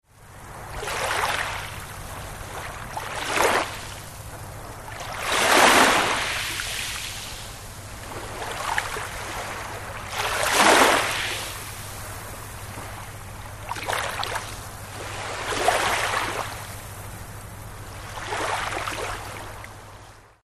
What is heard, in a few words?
Wave; Naoshima